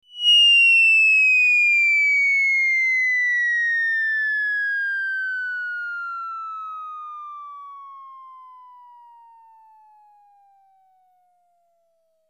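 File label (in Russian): свист при падении с высоты с ударом о землю комичный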